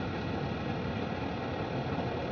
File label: Ambience Loop, outdoor